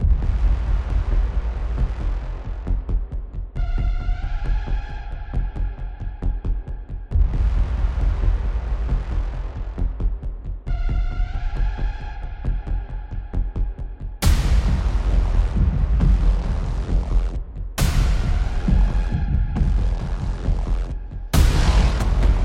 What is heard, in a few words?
Epic Powerful Intro, epic drum